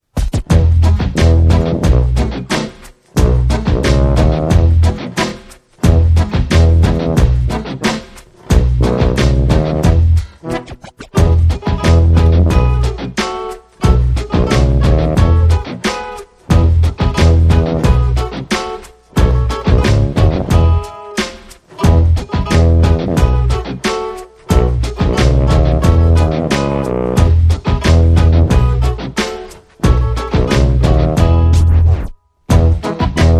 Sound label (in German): Oide Zither Zipfen